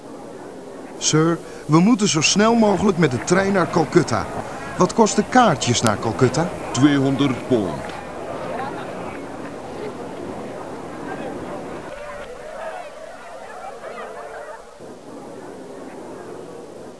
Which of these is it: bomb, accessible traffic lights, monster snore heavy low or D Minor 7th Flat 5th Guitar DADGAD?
bomb